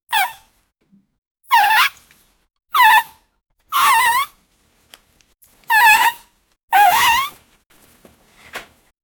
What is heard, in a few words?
sex sounds; sound effect